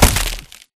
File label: railing smash impact; Titanfall 2